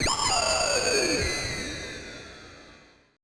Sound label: AMBI FX